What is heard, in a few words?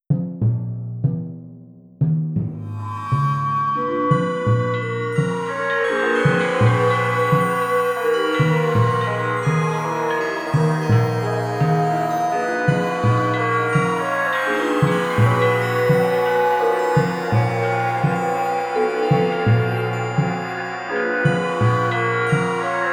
Perfect Love distorted